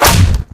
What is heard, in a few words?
melee tonfa; weapons